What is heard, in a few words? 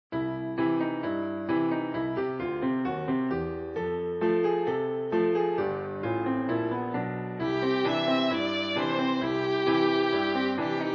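violin; Jingle Bells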